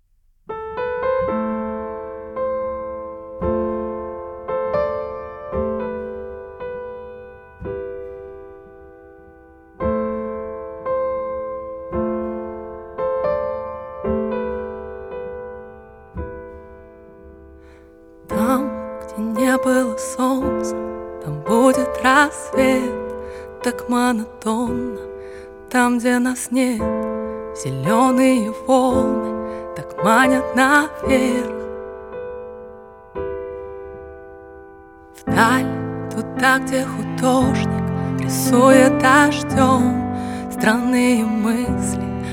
acoustic version

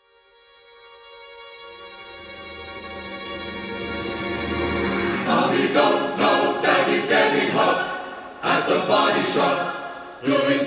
orchestral version

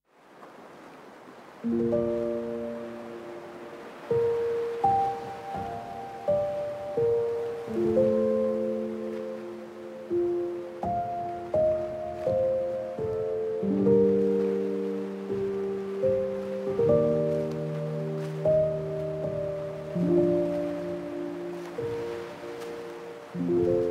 sweetest lullaby with ocean waves

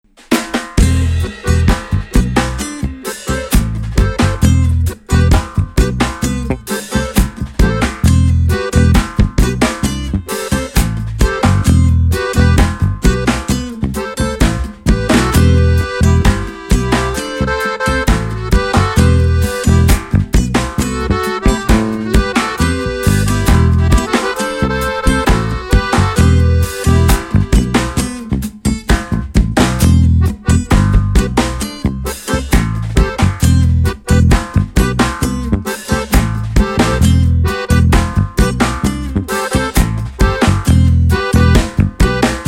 instrumental

